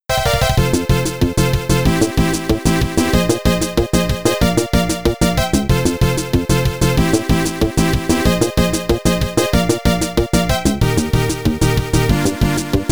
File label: Round Clear; marvin's marvellous adventure amiga